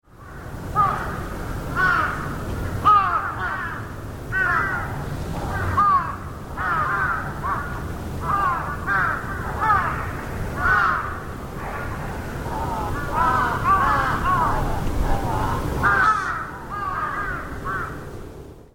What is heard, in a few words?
crows; JAPAN